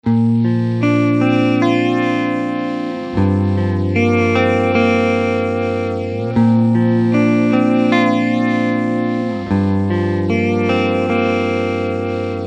destroyer; Mobius

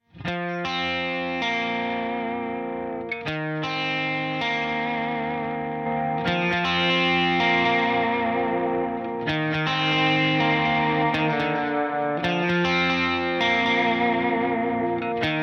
On Guitar Arpeggios

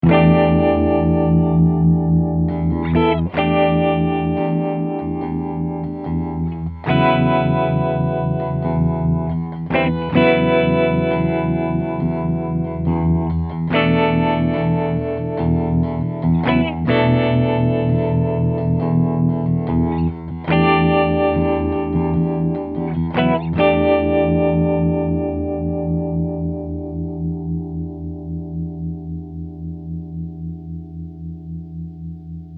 vintage trem; Mobius

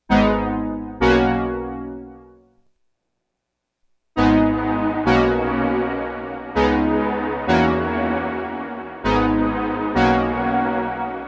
bloom, roland juno 6; bigsky

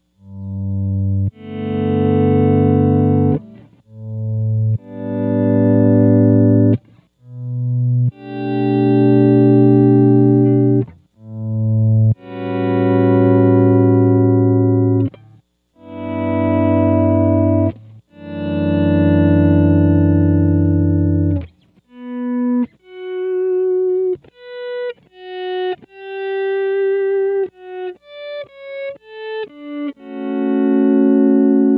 auto swell; Mobius

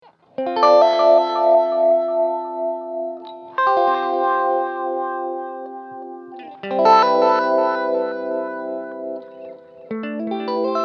Chorus; Jungle Lady

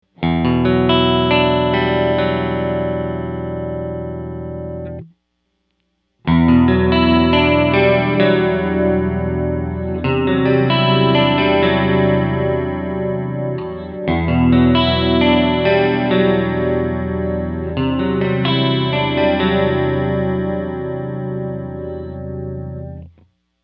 chorus; mobius

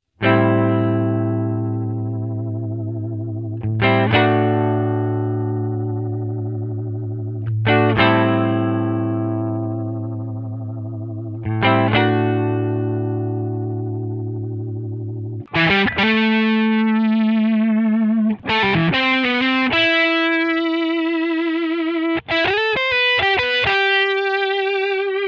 vibrato Ramp

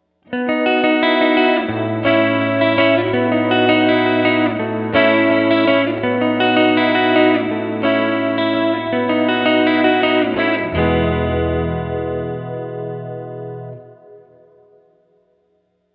room; BigSky; reverb; Sky Palace

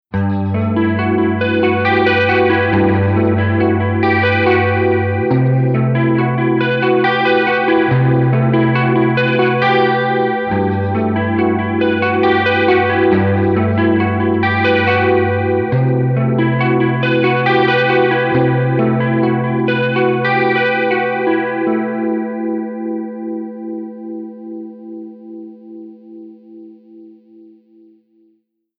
flanger; mobius

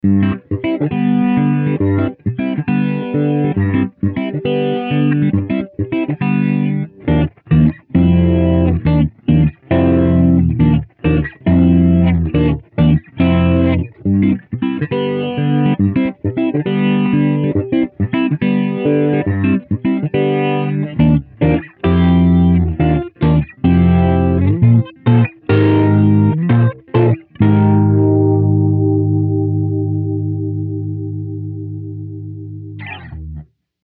rotary, mobius

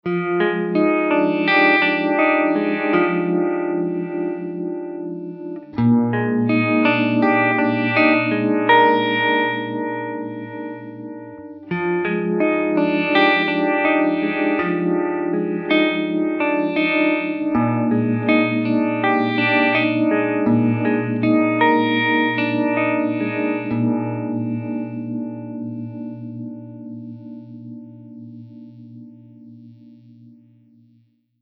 vibe; mobius

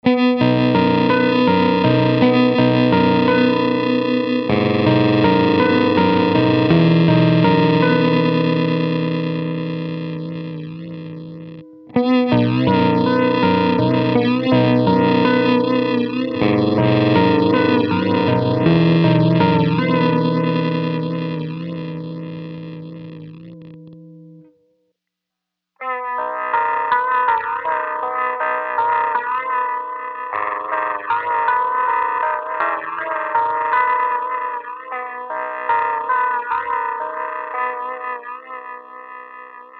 destroyer, mobius

